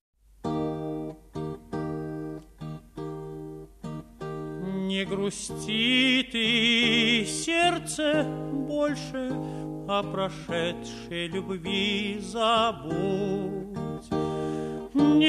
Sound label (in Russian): Колыбельная